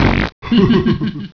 Fuhaha

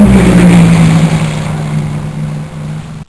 BOAT DOCK

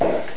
CORK

